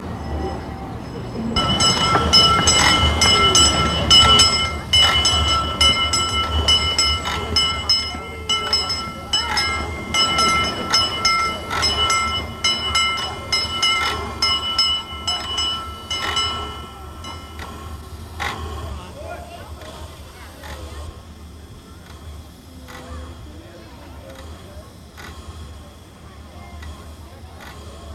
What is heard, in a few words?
Steam Train